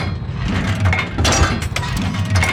gun reload; World of Tanks

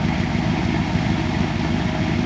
Aircrafts Engines Helicopter Engine; DCS

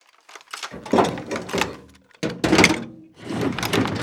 satellite idle; cliff; Call of Duty Modern Warfare

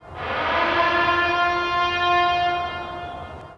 alarm echo

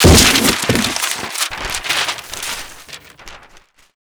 paper hit, Explosions

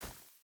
footstep barefoot grass run; Rust

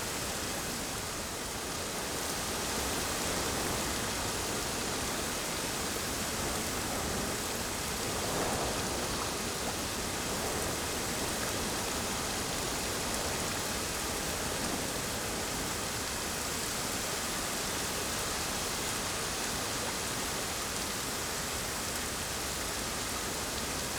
ambience desert day breeze rainy; Rust

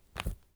tetrapak open; Escape from Tarkov

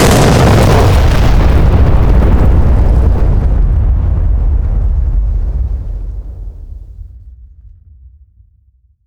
Explosions Explode Ground; DCS

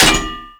connect metal

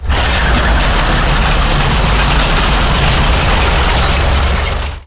turn rattle, tanks; War Thunder

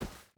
footstep hide snow run; Rust

